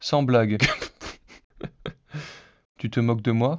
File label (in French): rire synthese contextuelle